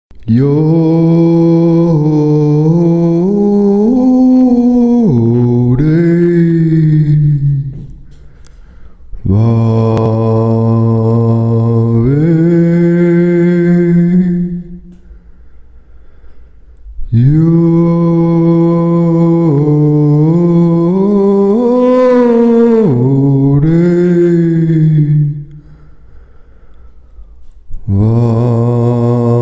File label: sacred chant